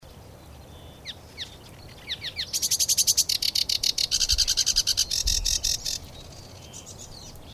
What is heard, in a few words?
sedge warbler acrocephalus schoenobaenus; Richard Dunn; Xeno-canto